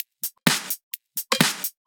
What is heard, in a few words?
Electro Loop 128 BPM; Vengeance Samples